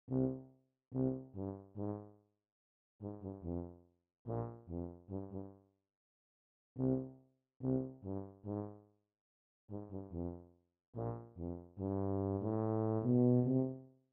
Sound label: tuba